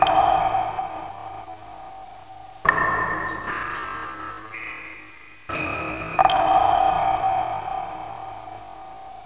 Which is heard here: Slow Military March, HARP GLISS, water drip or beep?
water drip